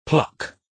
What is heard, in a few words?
pluck